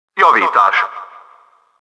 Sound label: repairing; Cross Racing Championship